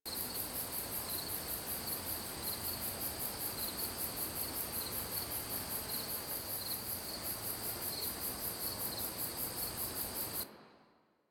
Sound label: Crickets Loop; Dagath; Warframe; Ambience; Clan Dojo; Dojo Misc